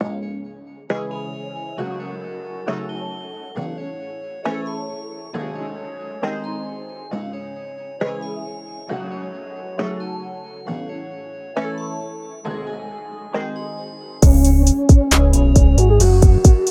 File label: 135 C sharp Minor, free beats